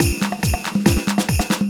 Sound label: Bgm ui User Match christmas